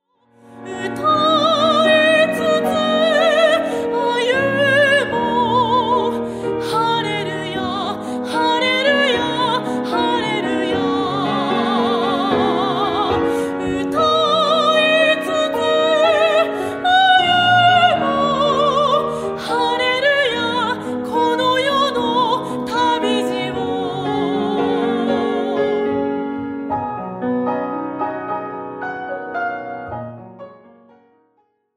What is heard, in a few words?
Singing